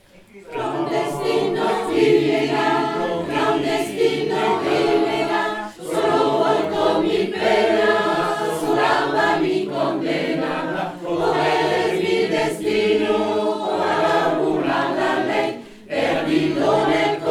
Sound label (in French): Chorale